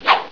SWIPE; Heretic II Demo; monsters

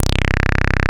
MONO, SYNSWEEP; Mirex

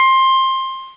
jud whine

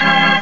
Etheral; Instruments

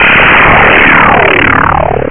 Gel; Missions of the Reliant II

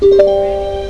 Harp

